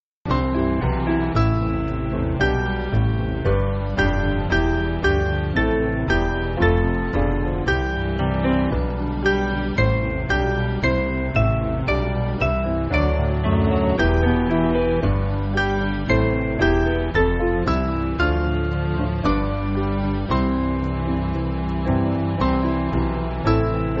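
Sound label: Band, Backing Files for Churches; Wake Awake; Wachet Auf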